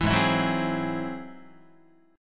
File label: G sharp Augmented 7th G sharp Guitar; G#+7 strummed; JGuitar